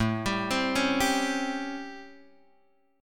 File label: A 7th Sharp 9th A down Guitar Open G